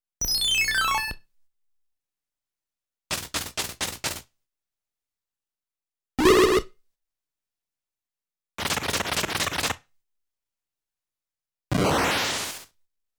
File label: Warp Sound ~ Boomerang's Flight Sound ~ Beam Sound; super mario world live; Koopa Junior's Beam Sound; Koopa Junior's Spinning